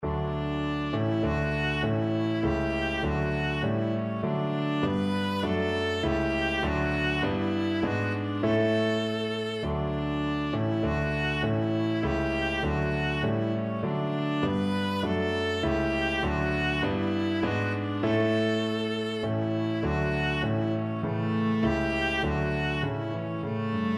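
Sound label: german national anthem, viola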